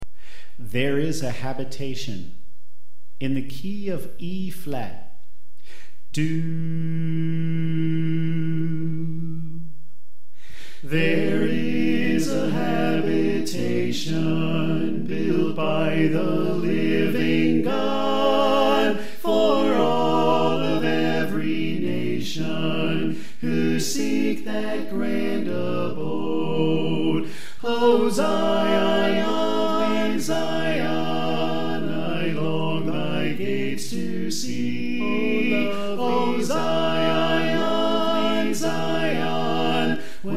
Soprano Left

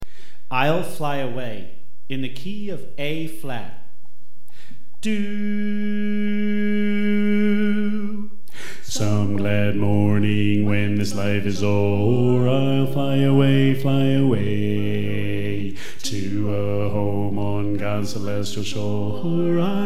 Bass

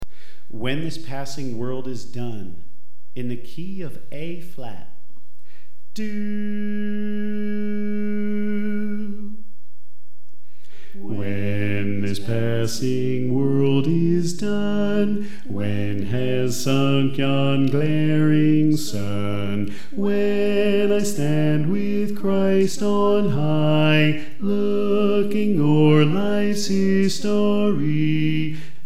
Bass

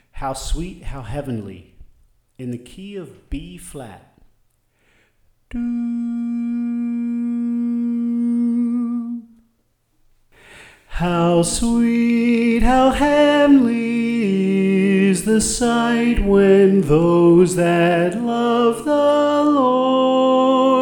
Soprano

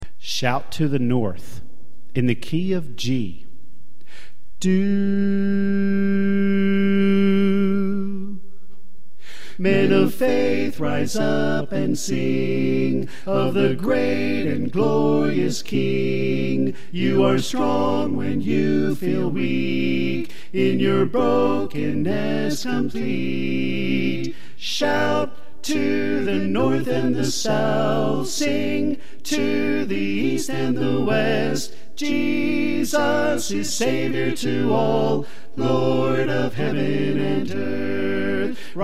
Soprano Left

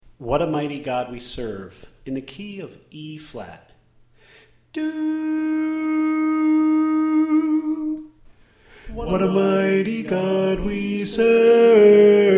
Tenor

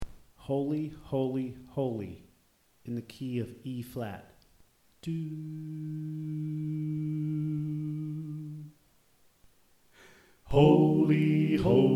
Soprano Left

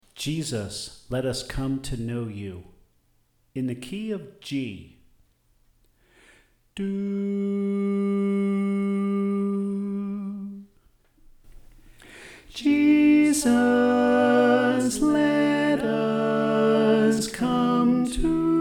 Tenor

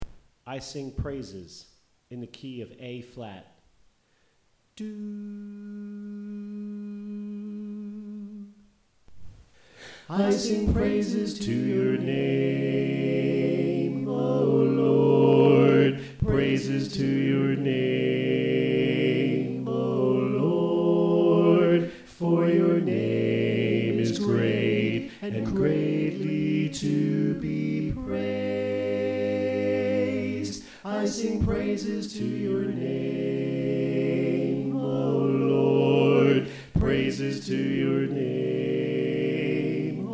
Alto Left

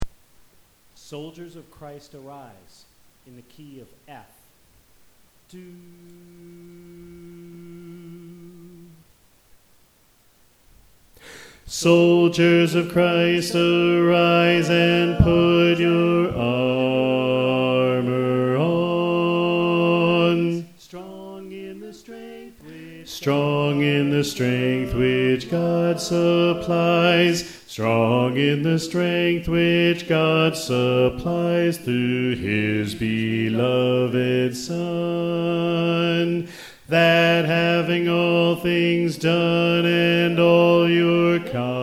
Bass

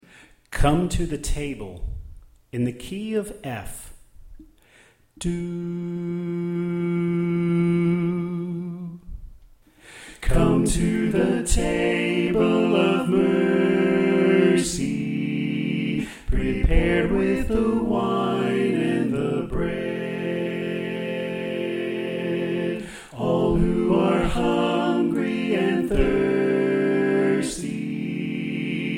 Soprano Left

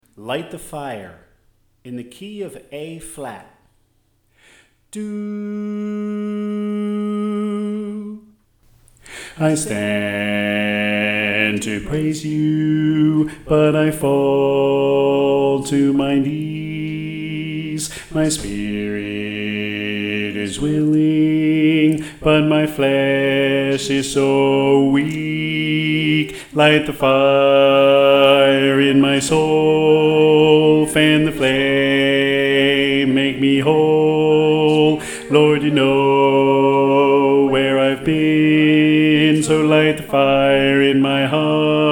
Bass

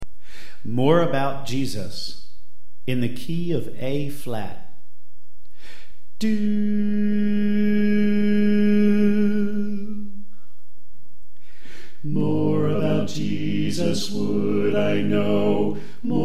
Soprano Left

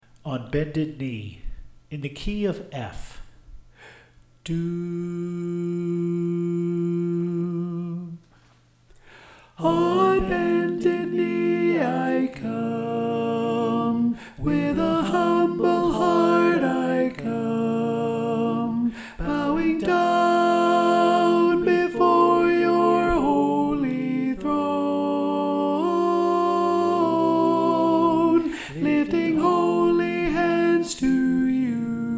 Alto

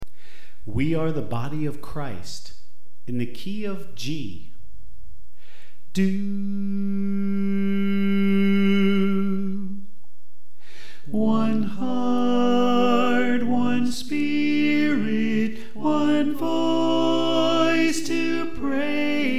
Tenor

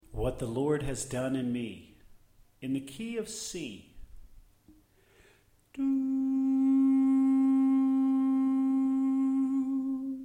Soprano Left

